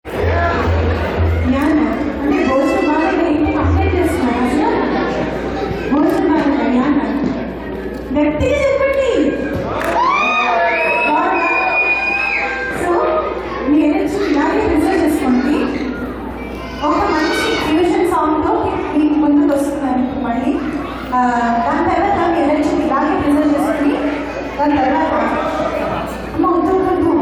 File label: singing medley of 3 songs with a live orchestra; at Salt lake City Show Nov 7 2015